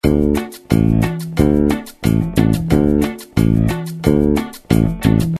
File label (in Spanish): Patron bajo cumbia; how to play cumbia bass